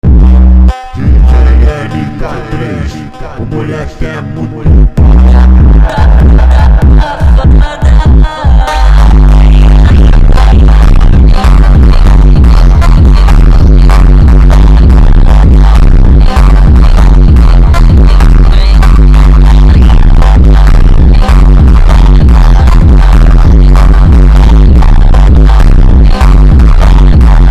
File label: Montagem superbass reverb slow